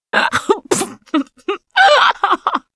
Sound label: laughter